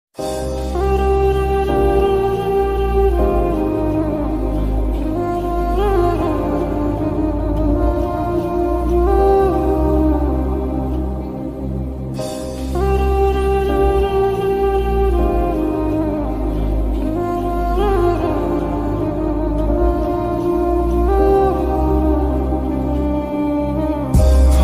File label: love bgm ringtone tamil flute